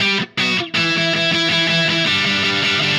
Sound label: Happy Pub Guitar